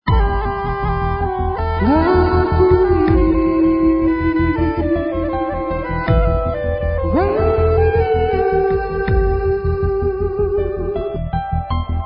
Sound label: radio jingle; ngapuhi